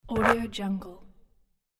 net swoosh

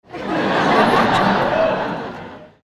crowd laughing

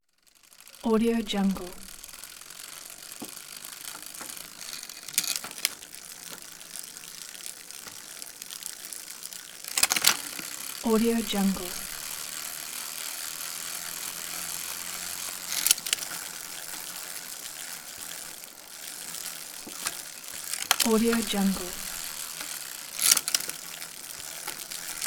bicycle chain and gears

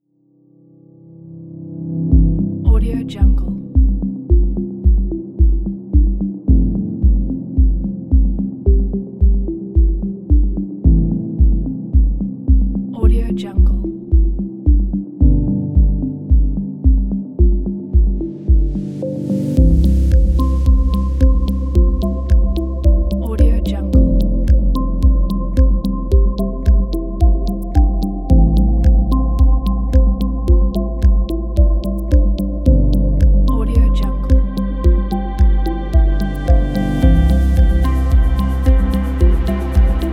inspiring ambient minimal

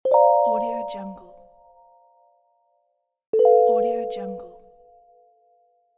interface notification

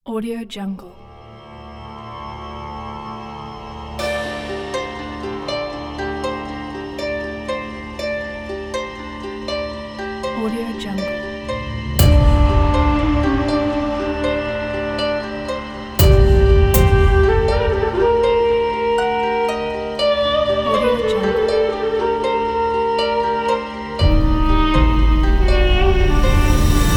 middle east ethereal vocals; bosone

